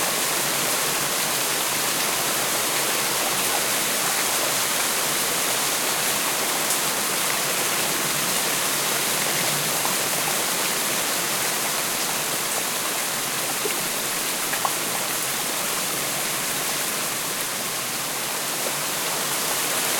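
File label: Rain Vegetation Ambience Rain Moderate LOOP; InspectorJ; Essentials 06 Rain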